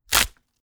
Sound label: Bone Breaking